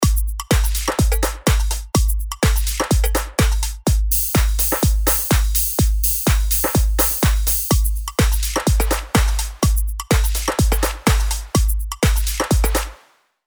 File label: Dance; RP Punch Dance